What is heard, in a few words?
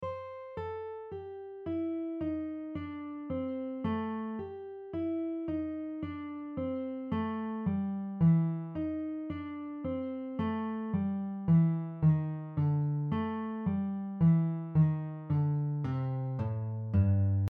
8 note descending blues scale pattern, guitar; you move down the blues scale in an 8 note consecutive pattern